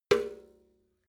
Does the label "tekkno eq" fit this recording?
No